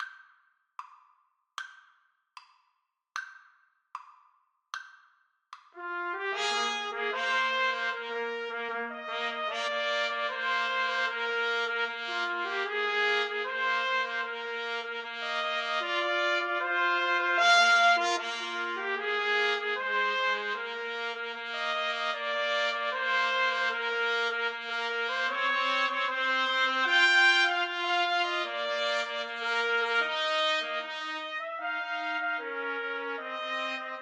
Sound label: Trumpet Trio